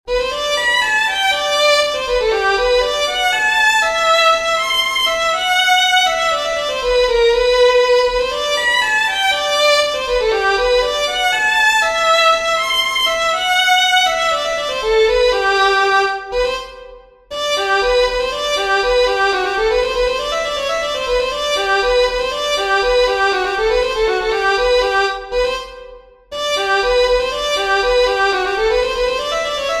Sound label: REEL, fiddlers; PARRY SOUND; Southern Kings Fiddlers; peifiddlers